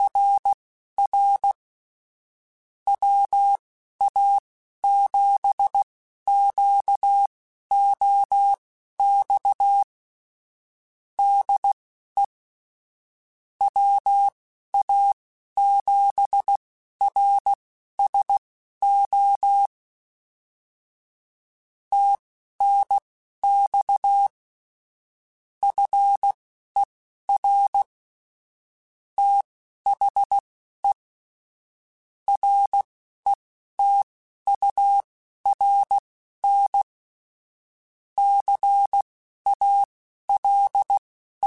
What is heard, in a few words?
Morse Code, QSOs